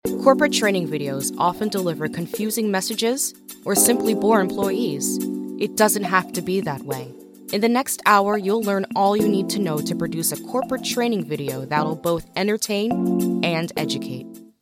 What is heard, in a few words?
Corporate Train MUSIC